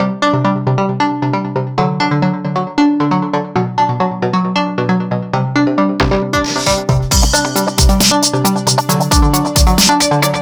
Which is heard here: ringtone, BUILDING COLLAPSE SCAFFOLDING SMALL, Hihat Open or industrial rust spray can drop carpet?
ringtone